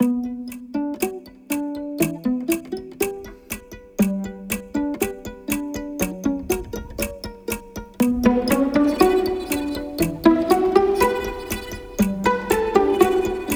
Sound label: Intro Music Stero; WarHorse